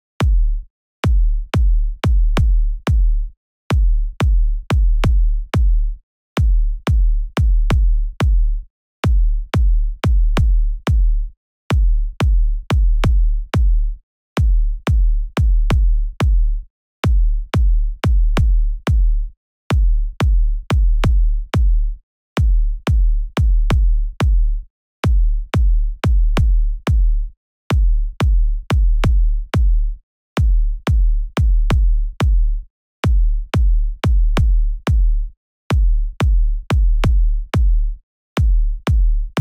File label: beat